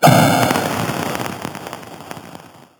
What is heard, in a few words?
ball explode; Handegg